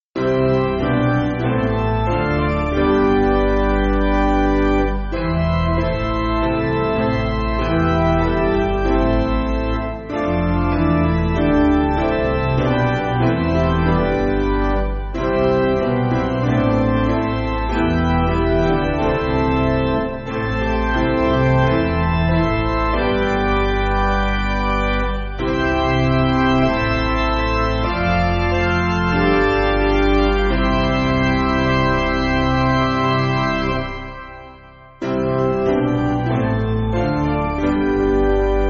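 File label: Piano, church backing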